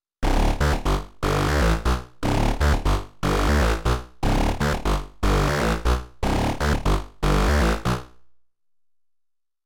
Studio Electronics Quadnic 4 Waves

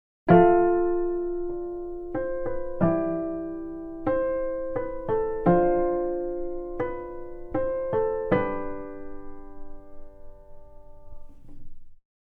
Harp; Tiree

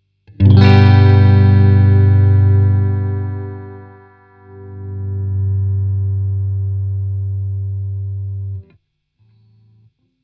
Guitar HPF; grace design felix

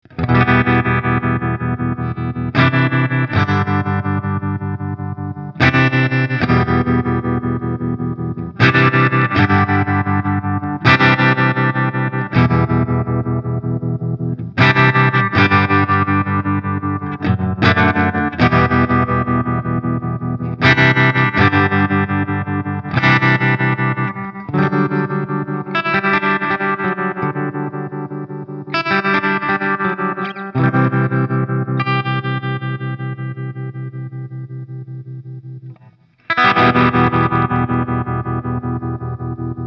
OPTO Trem

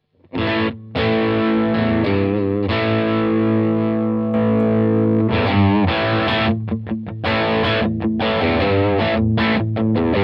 Blackstar St. James Comb Mic Channel 2 Boost

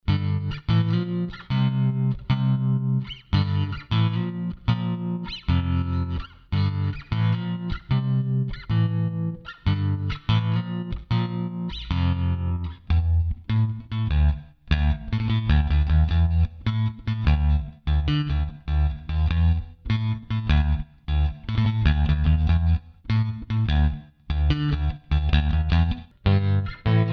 Rickenbacker

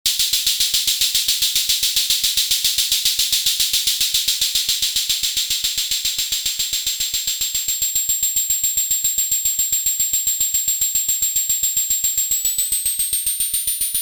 AVP Synth ADS 7 Closed Hat

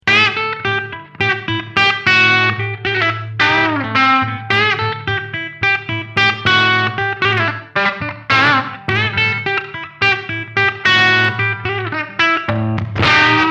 Light Crunch

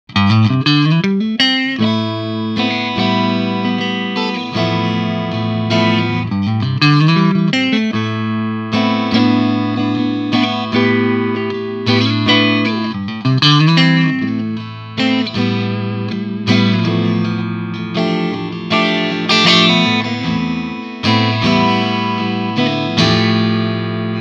Clean Triode Single Coil

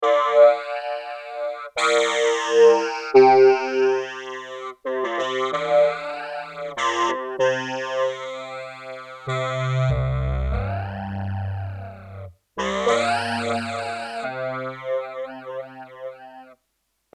system8 phaser FX

